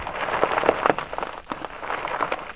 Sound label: drv grav